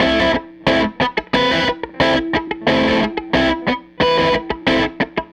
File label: Les Guitar Loop